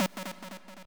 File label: sub lead f#2